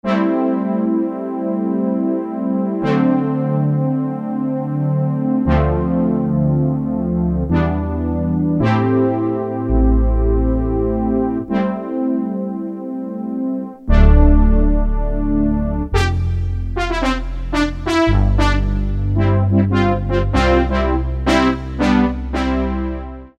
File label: SYNBRASS, Korg DSS-1